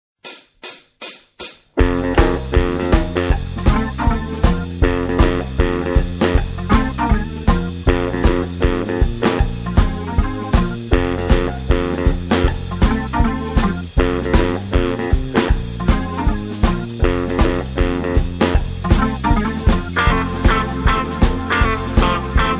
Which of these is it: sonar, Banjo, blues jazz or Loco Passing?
blues jazz